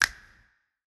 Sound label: Snap; Drum Kit; Feeling; Cymatics; GHOST (Beta Pack)